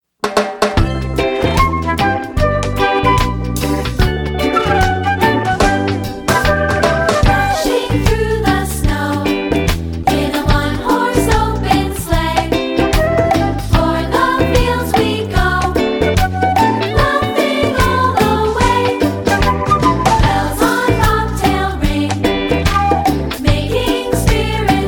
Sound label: Jingle Bells